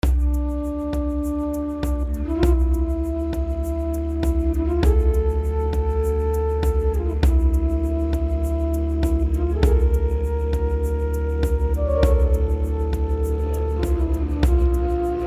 desert wasteland

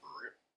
croak, frog

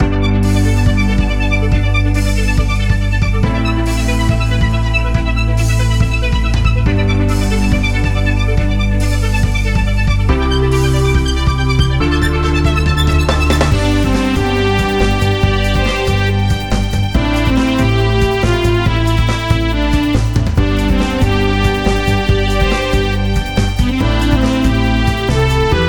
racing game title bpm140

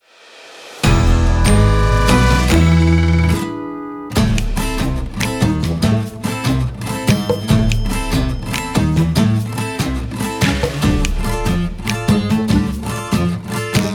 acoustic comedy

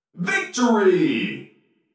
victory; MR. HAPPY - Video Game Announcer

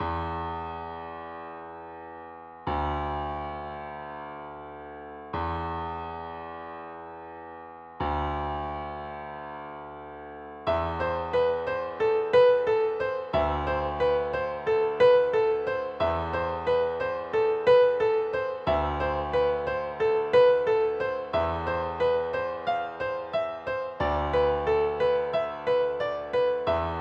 piano

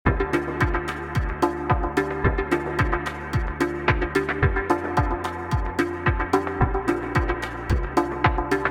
echo dub

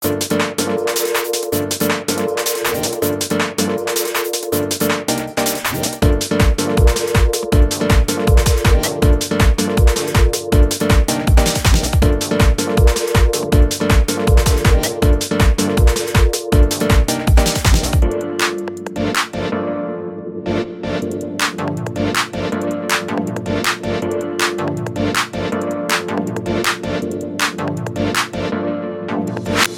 Underwater battle

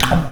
nom

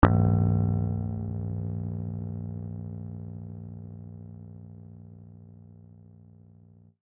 Tolling Bell Bright

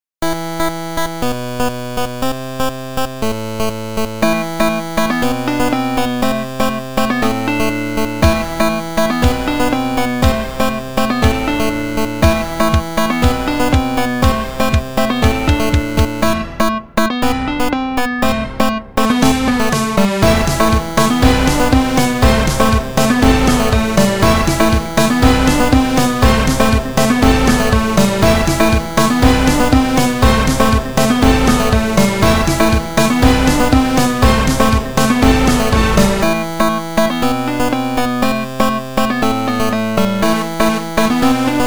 chiptune; 8 chiptune tracks chipville

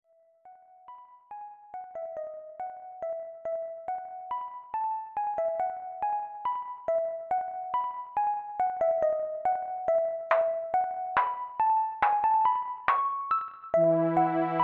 pod calm

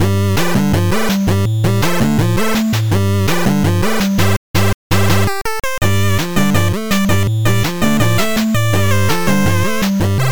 mountain stage bpm165

